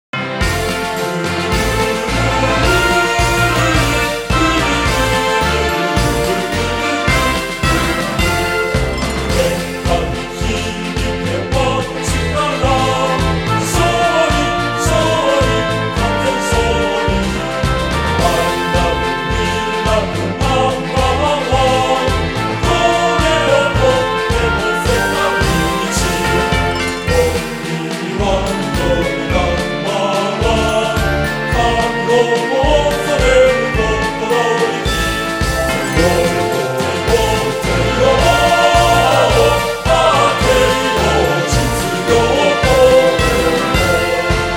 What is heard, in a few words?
High School Anthem; Teiou Business High School